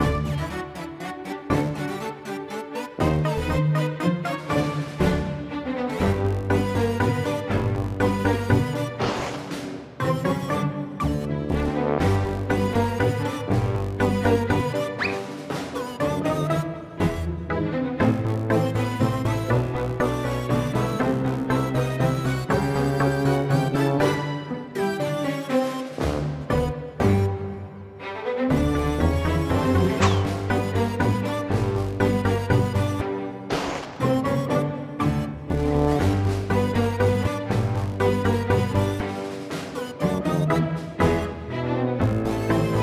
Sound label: Goofy Ambient